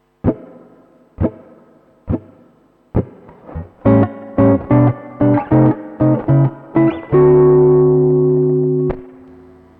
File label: root movement sample